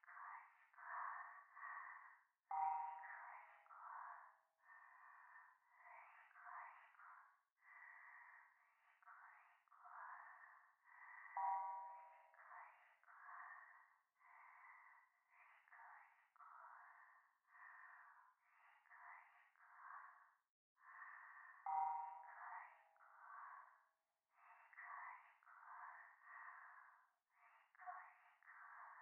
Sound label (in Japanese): しこしこ 寺; 夢の中